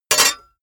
sword block metal

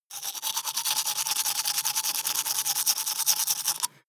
teeth brush dry open inward

